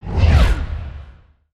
asteroid whoosh by

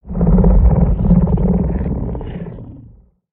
Purr; creatures pets

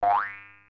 spring; build mode

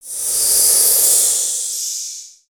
bats pitched; ninjago

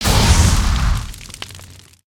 Hot Dog Flame

